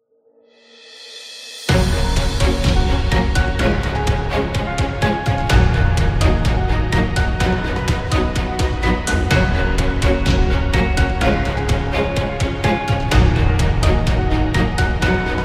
Corporate Epic

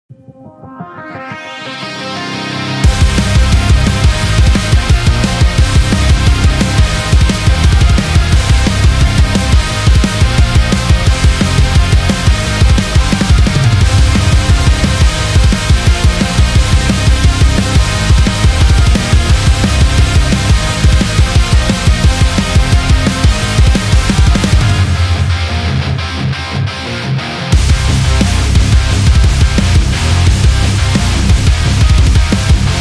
Extreme Rock; gym rock

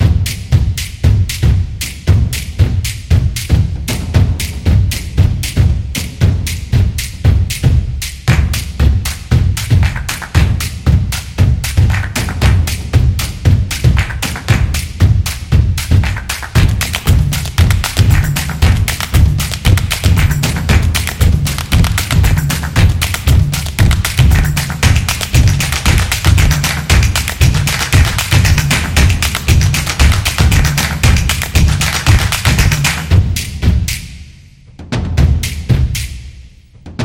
This Is Drums

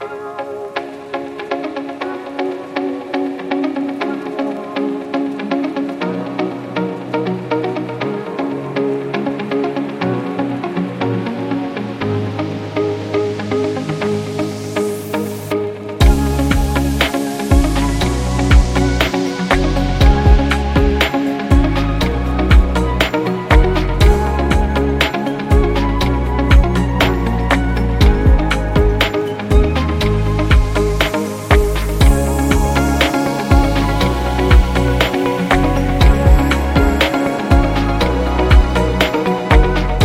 Upbeat Lofi Corporate

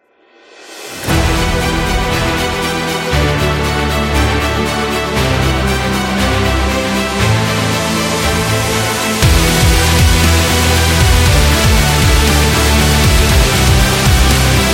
Epic Symphonic Metal

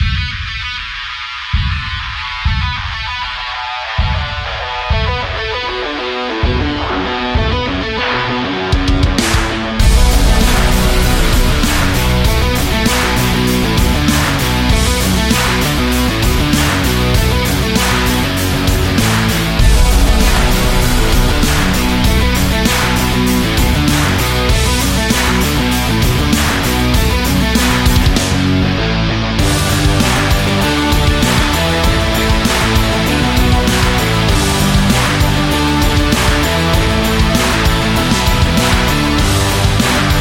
Energetic Trailer Rock

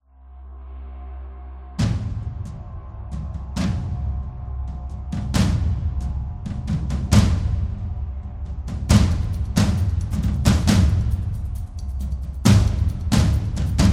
Africa Drums; This Is Africa Drums; epic drums

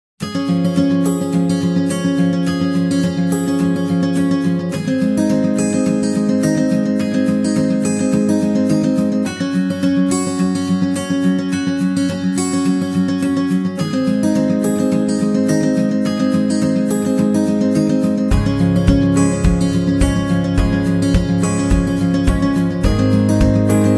Positive Background; corporate music vol 15

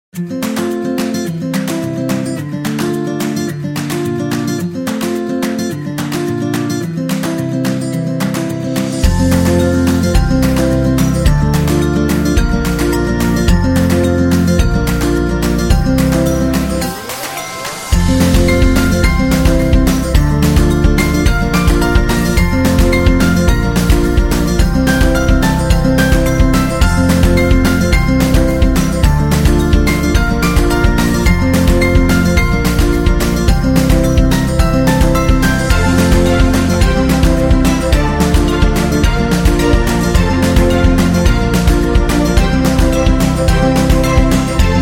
Guitars

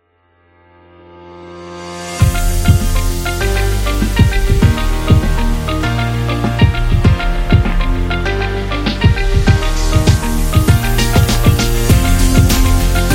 Promo Music

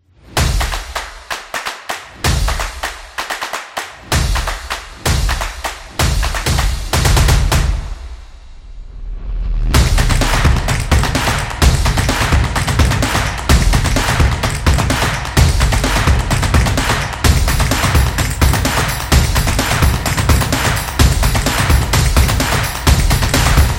The Clap Drums

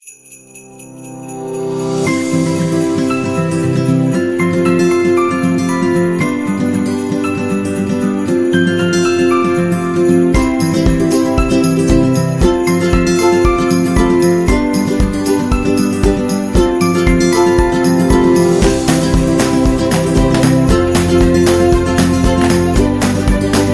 Christmas Happy Upbeat

